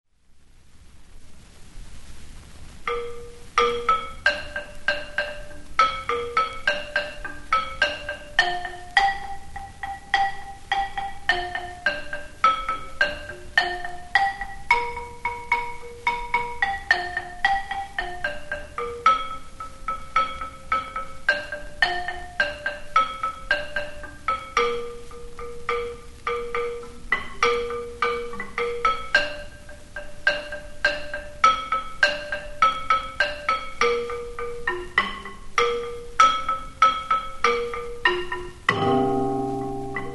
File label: gambang, gamelan